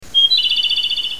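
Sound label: Japanese robin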